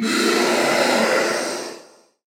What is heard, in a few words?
Magician Death